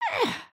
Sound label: sleep turn; emotes